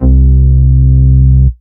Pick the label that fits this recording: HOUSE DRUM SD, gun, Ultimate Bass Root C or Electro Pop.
Ultimate Bass Root C